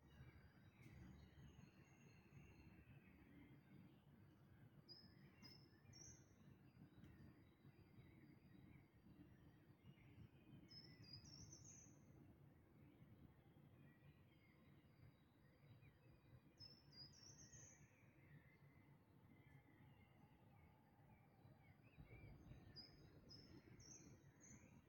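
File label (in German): Dawn Chorus, Vogelgesänge vor Sonnenaufgang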